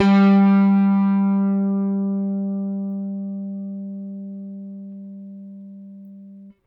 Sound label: RickThumb-C, RickenbackerBass